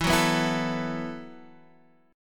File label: E Suspended 2nd Suspended 4th, down Guitar Drop D